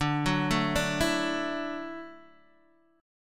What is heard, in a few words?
D-Major Add 9th, D, Guitar, DADGAD